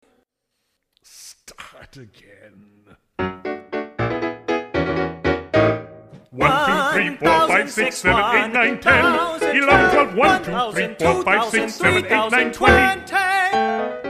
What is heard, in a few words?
Counting Song